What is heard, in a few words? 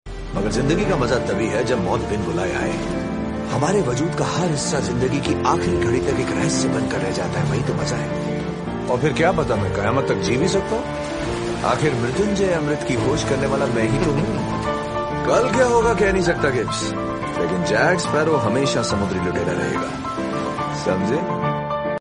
remix bgm theme; jack sparrow